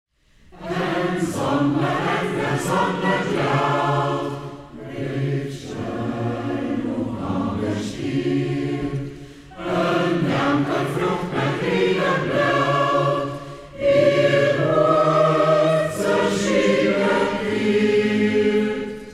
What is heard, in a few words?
liedertafel, Hermannstadt; Ortsmundart: Hermannstadt; Heltauer Liedertafel; Hegt wird gesangen